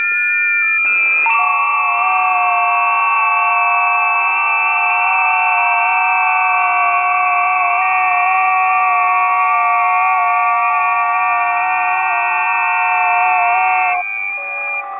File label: 20m busy; ft8